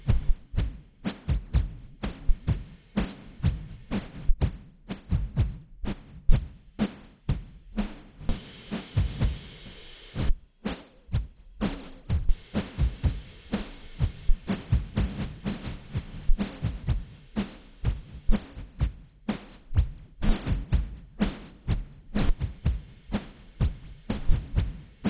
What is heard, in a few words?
gen drum